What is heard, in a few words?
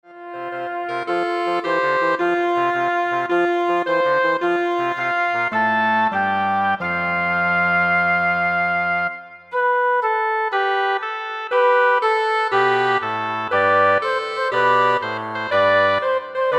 Woodwind Ensemble